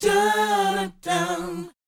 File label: BOYZ D, Vocal Planet, R&B Dance; Spectrasonics Vocal Planet